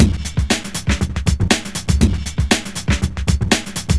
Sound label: Busy, Breaks